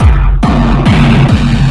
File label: Loops Fills; Vengeance Samples